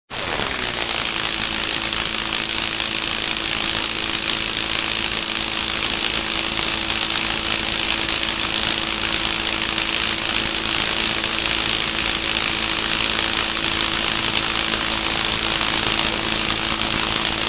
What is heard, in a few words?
Electrical fluttering; Doctor Frankenstein and Frankenstein monster